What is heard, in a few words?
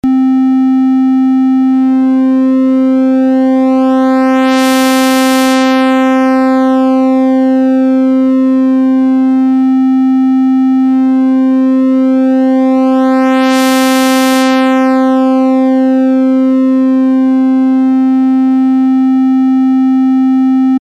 v-synth tri